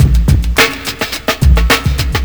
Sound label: Beat Loop